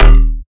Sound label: bass hit operators D#; Virtual Riot